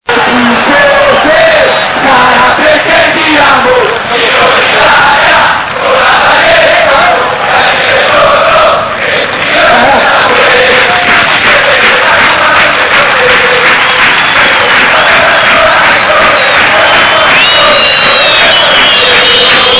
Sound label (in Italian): Cori Stadio; calcio; Reggina; Giro l'italia